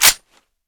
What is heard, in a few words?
slide forward, gun; explodiumgun